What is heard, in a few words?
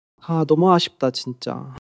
crying